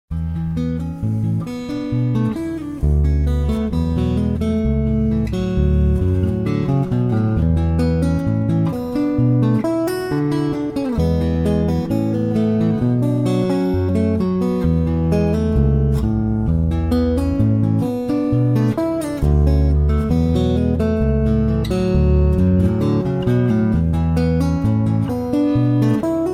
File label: baritone, 17" Baritone Semi-Hollow Archtop; Holst Custom Instruments 2021; Guitar